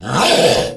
zombie pain; cstrike

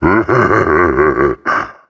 Scrake Infected; zombie plague

